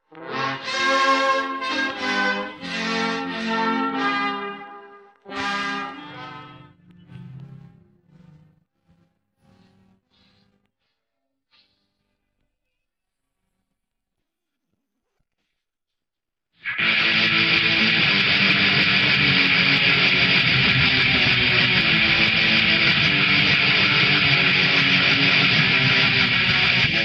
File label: guitar